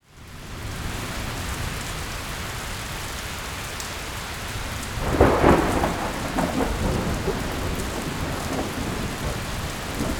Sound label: Weather Ambience Rain Thunderstorm Thunder; AdobeCC